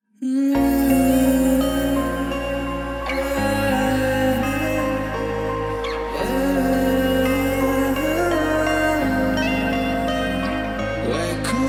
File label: Lofi Remix